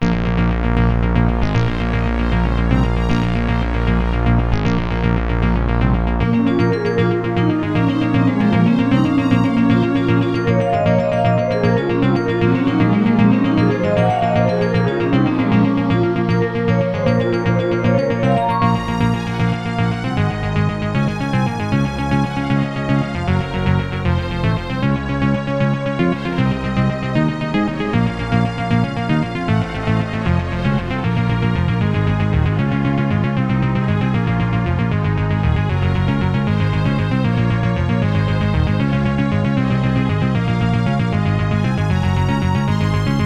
Pinnacle

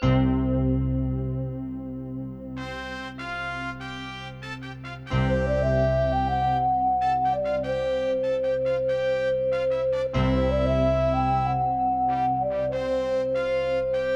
Pinnacle; Space Quest 3 Introduction

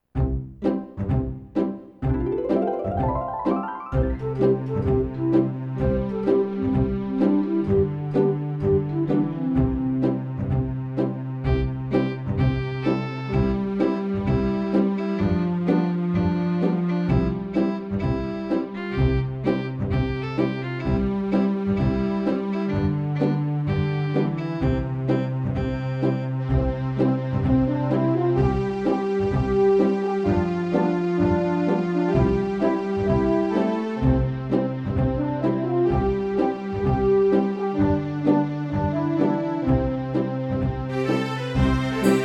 AW744L; Settlers Intro

